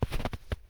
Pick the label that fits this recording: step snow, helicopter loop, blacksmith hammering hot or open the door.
step snow